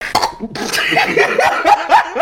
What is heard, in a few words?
Laughing